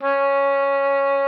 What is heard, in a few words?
C#4, OBOE; Xsample VOL-6